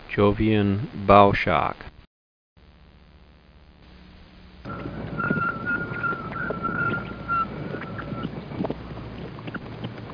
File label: Jupiter Bow Shock